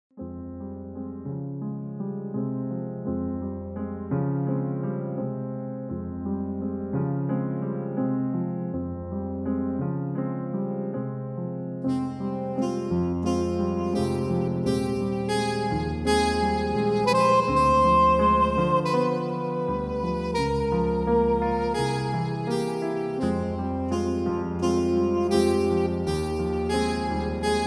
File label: Fm piano play reference sax